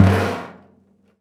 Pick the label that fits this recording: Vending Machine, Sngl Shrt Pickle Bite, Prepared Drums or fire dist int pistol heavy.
Prepared Drums